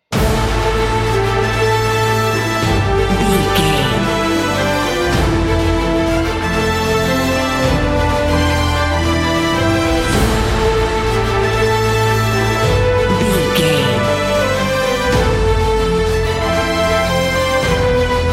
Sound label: epic cinematic